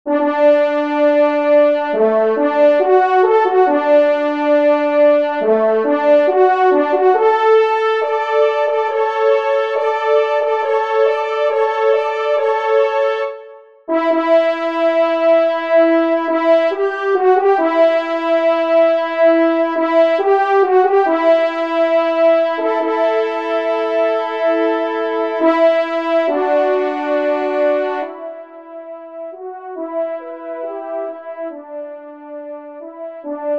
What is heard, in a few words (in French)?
trompe; L'Esterel; Tyndare Gruyer; 2e Trompe